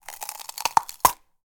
Bell, Pepper, Crunch, Crack, Break; Mattia Cellotto; Crunch Mode

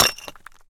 stone impact hammer; BluezoneCorp

